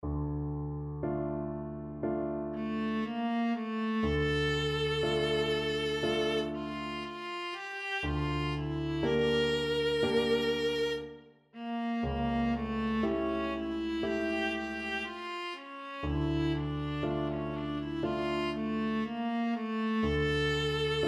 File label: viola